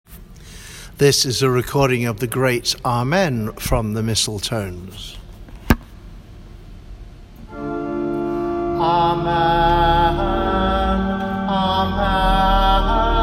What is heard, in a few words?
Missal tones Amen